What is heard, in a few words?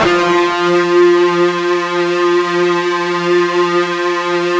FUZZ F 2, Guitar; ULT FZZ LOOP; East West; Steve Stevens Guitar Samples Collection